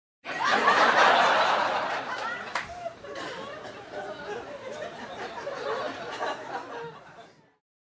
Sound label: Group of people in a close room laughing